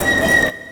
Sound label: chme3 swist